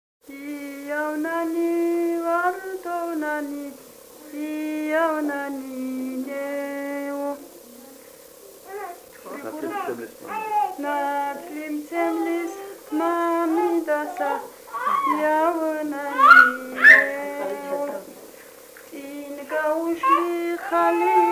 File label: Iavnane, East Georgian Women, Georgian Folk Songs; Agmosavleti